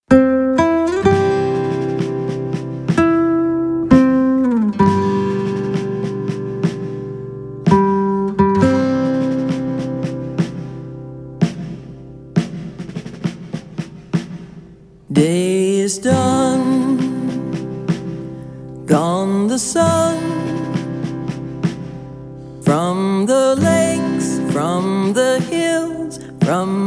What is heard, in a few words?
Taps